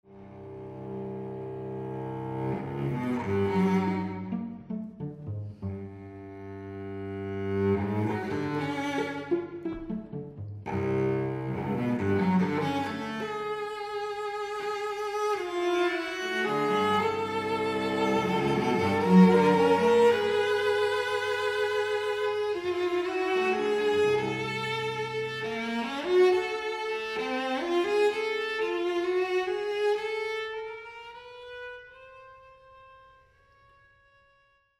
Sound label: dialogue for two cellos